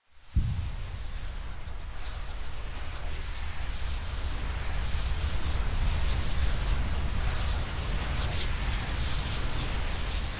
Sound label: focus health charging